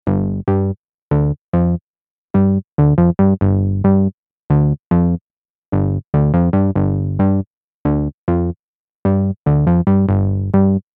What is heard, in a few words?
bass